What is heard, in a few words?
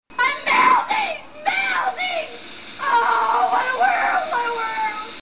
melting